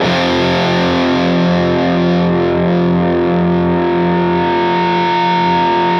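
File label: fuzz chord, fuzz guitar vibrato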